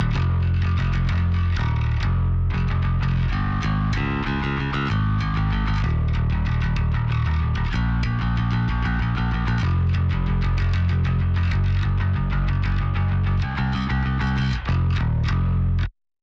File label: bass; sansamp psa1